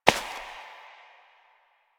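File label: Step Ice, footsteps; Warframe; Koumei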